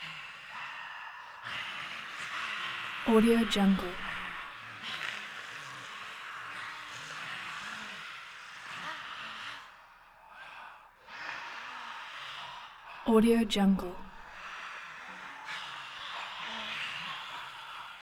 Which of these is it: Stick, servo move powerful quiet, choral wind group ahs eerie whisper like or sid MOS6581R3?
choral wind group ahs eerie whisper like